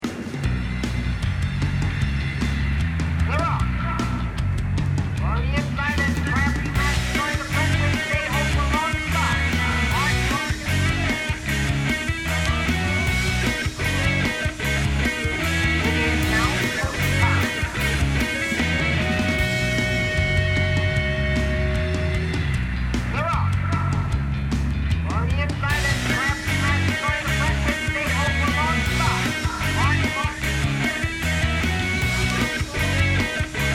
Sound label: Pop Song